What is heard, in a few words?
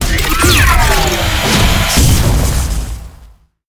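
boss attack, monster; Bizen; steamer crazy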